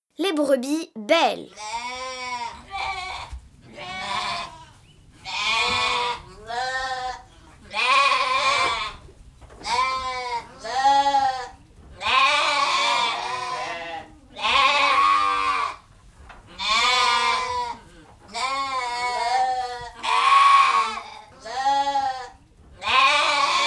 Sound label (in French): Les brebis; Mon imagier sonore de la nature